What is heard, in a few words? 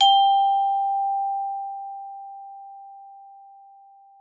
GLO G3, GLOCKENSP; Xsample VOL-4